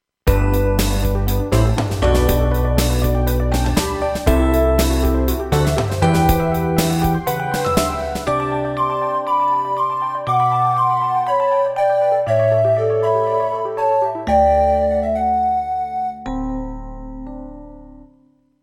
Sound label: inst